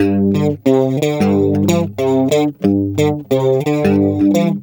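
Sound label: Guitar, G; Best Service Gigapack 2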